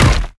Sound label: spine Rain impact; heroes bonehart